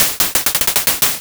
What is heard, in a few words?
squeeze